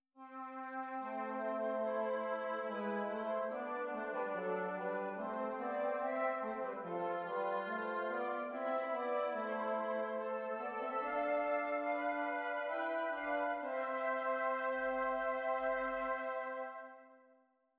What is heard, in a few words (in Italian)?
esercizi di doppio contrappunto nella decima